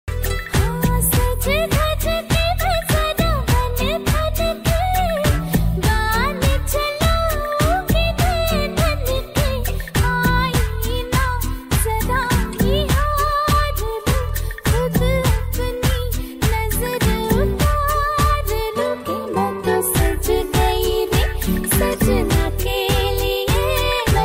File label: dj remix, hindi ringtone